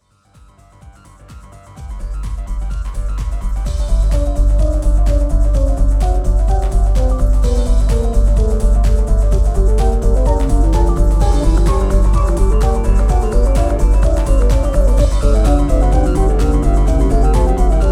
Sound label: Bach WTC Fuga 12; J.S.Bach